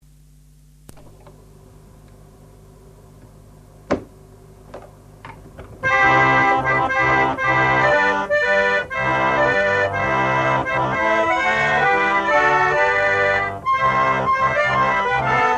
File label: barrel harmonium